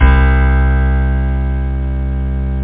piano low; OctaMED SoundStudio V1; instruments

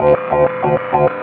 feedback trill D; 11,025 sample rate; SpecOps2MPlayerDemo; Music

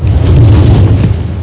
TREE FALL; Education Publishing Concepts; NOAHDEMO

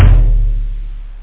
dry tom; toms

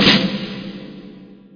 snare amb; SpecOps2MPlayerDemo; Music

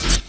Zerg Menu Popup; BroodWar

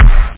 Bassdrum

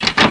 BLOCK

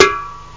snare; OctaMED SoundStudio V1; jazzies samples

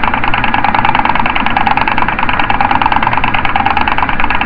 Spinning Bicycle Wheel

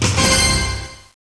menu; X-men

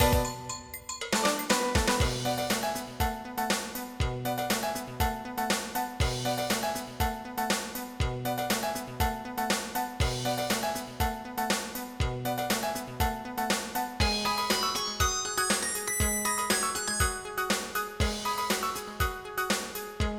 funk

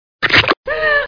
ICE

